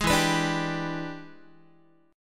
E 7th Sharp 9th Guitar, chord; E7#9 strummed; JGuitar

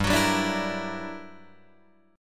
G Minor Major 13th, G, Guitar, DADGAD; GmM13; strummed; 5,9,5,7,7,8

